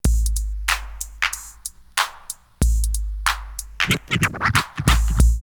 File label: SCRATCH 93, drumloops OldSchool; X-Static Goldmine